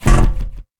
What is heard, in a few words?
Ground sword broken; Inotia 3